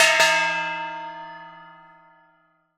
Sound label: ding Ding